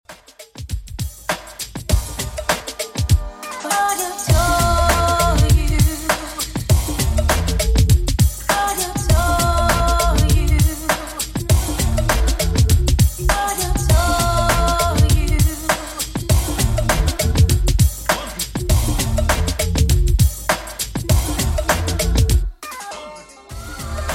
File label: 80s Redrum, 100 bpm